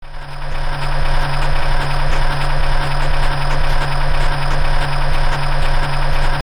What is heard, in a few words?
moving truck in motion; Pokemon RSE